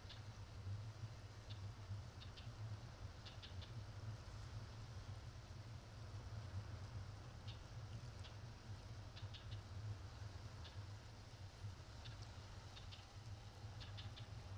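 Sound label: hammer killer whale playback; hammer-kw playback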